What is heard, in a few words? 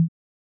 grunt